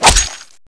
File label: bayonet hit; sterling